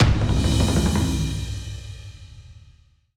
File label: Stinger End; skykeepers; ps4 windows xbox one gamerip 2017